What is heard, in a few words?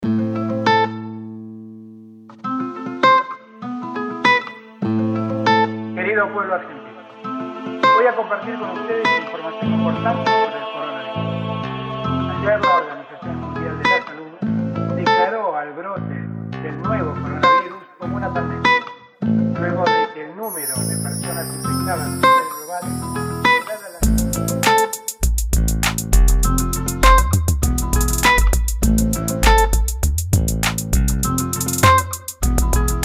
Trap